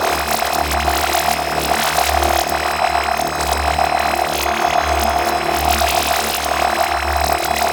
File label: Droid Array Loop; Warframe; Cephalon